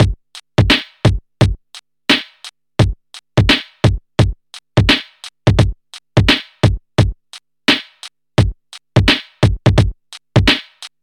86 bpm drum loop g key, Free breakbeat sample - kick tuned to the G note. Loudest frequency: 1297Hz